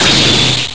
Light Blast Hit; Vaati's light blast hitting something

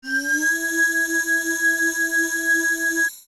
Nayrus Love Cast; Casting Nayru's Love

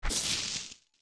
Steps Tall Grass Land; Jumping and landing in Tall Grass (young); OOT

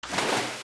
Tektite Water Jump; Zelda; OOT

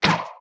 Arrow Shoot; Shoot an arrow (Recorded)

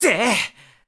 Throw; Link; zelda sounds; Skyward Sword

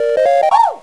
Bubble Get; Collecting a bonus bubble (2 sounds played together); zelda

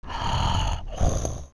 Snore; Talon

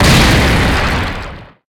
Bridge Collapse; The Bridge of Eldin collapsing

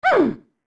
Annoyed; Mamamu Yan annoyed

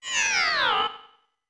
warp respawn out full; OOT

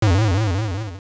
Link Rebound; Link rebounding from running into a wall (a "big bounce" sound also used by the Moblin King and Rolling Bones mini-bosses)

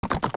Epona Land; Epona landing

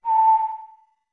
Notes Whistle A med; OOT

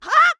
Link Jump; The Wind Waker; Link jumping

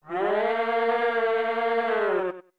Four Giants Shout

